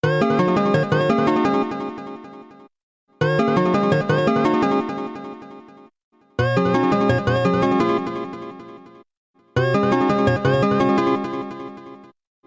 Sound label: Ringtone; Deltarune